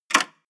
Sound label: medieval click light negative